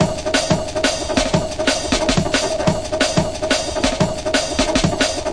helicopter dnb dark, drum loops and breaks, 180 bpm